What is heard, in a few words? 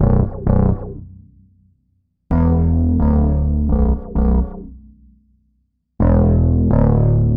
Philter (phat bass); Sonic Foundry (Sony Creative Software); Ugly Remnants VOL-2